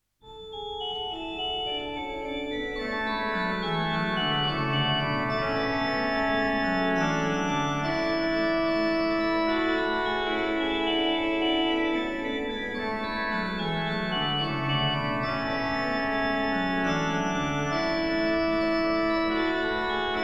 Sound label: In dulci jubilo, organ; Bach; Bach Organ Works; Choral Preludes